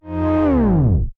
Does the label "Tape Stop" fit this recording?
Yes